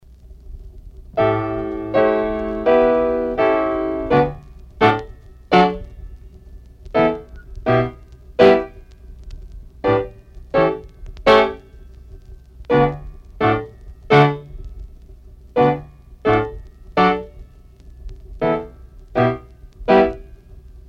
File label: shuffle jump